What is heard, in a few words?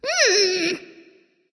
tongue; freya